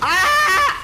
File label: aaaahhhhhh